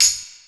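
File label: tambourine, Yamaha SY22; Drums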